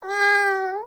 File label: Kitten Meow Playful; Warframe; Catbrow